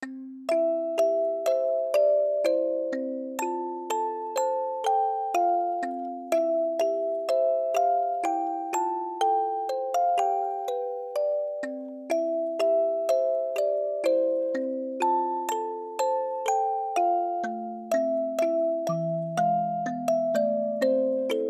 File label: Music Box